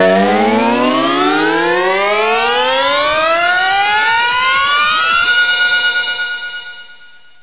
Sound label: Power Up; wnflash